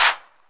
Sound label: Korg KPR-77, drum machine